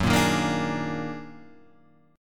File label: D sharp Minor Major 11th F sharp